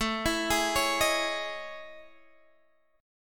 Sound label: A Major Sharp 11th, Guitar, Standard; AM#11; arpeggiated; JGuitar